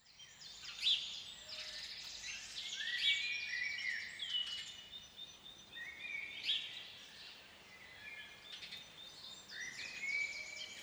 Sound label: prelude birds